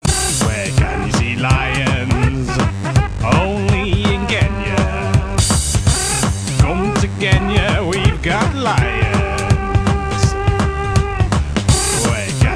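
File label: Is Calling R&B, ringtones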